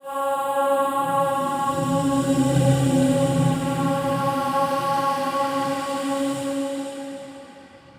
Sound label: Choir Atmos, cinematic drama Soundbeds; musicradar